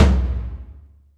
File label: FLR, TOMS; HERO; Back In Time Records; Big Bang